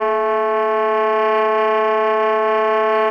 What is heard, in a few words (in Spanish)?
Tremolos